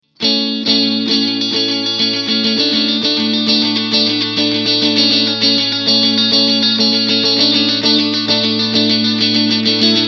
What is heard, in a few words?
ac30 chime test